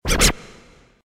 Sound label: combo break